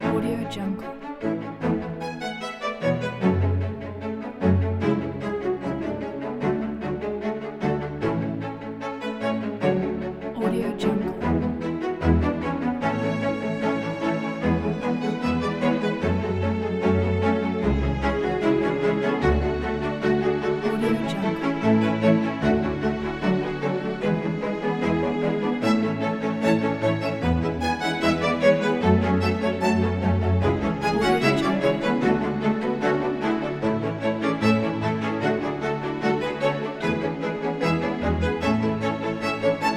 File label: the strings